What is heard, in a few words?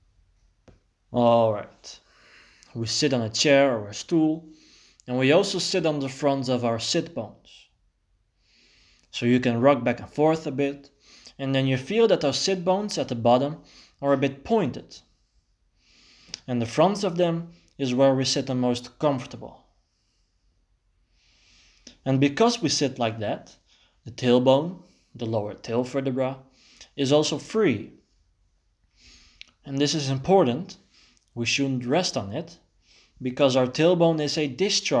sitting Accent in pelvis f sound faa and foo